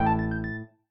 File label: combo 5 power